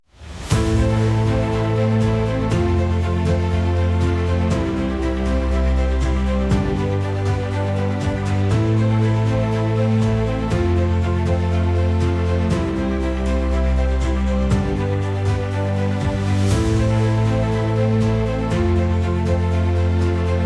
majestic voyage

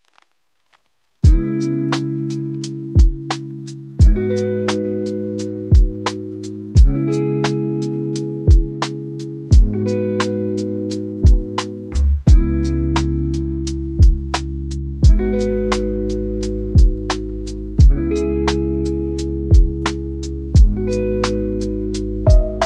peaceful evening, dlya raboty

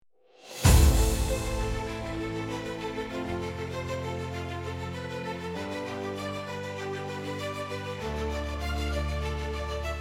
rise above, epic

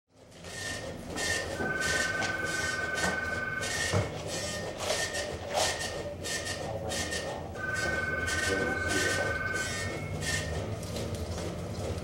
office

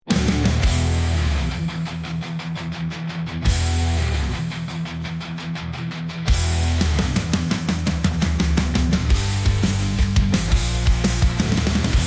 electric surge, agressivnaya

